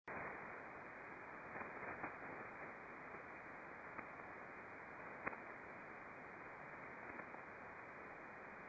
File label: naqp cw; contests